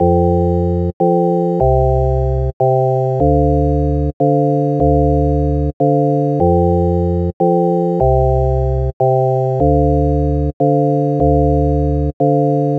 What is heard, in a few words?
Happy Melody Loop - 150 BPM F Maj Chords; Melodies; Loop Stems; Cymatics; Oracle Sample Pack; Happy Melody Loop 3